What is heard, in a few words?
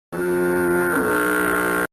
A goofy ahh ship horn sound effect